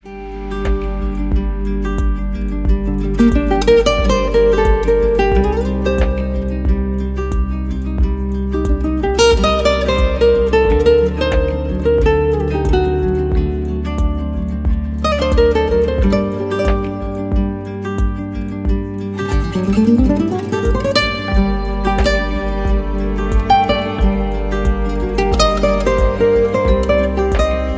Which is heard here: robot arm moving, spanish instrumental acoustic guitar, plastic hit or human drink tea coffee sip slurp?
spanish instrumental acoustic guitar